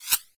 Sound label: bullet pass